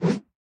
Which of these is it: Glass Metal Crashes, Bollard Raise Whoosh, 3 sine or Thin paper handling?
Bollard Raise Whoosh